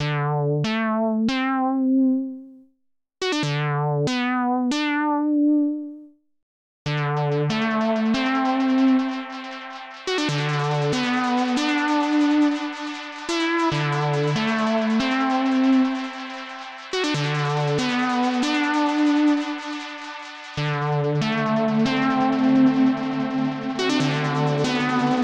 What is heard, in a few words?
Analog Synth; Droplet